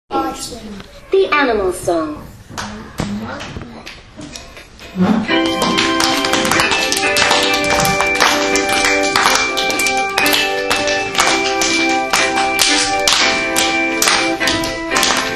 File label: a mountain music